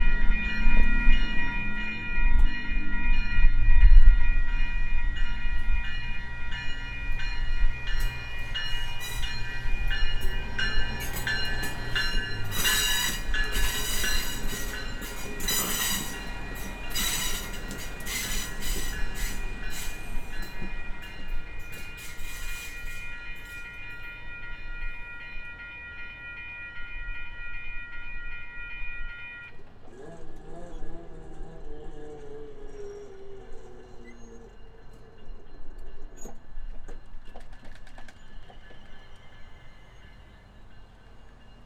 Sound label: Train Santa Rosa; XY